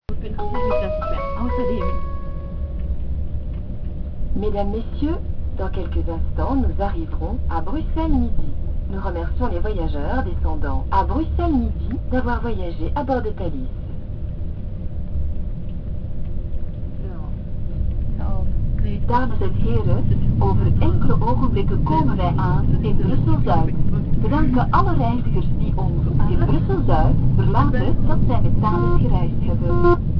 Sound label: thalys